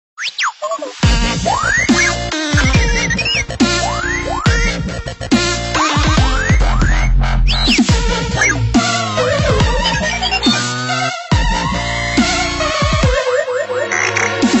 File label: r2d2 dubstep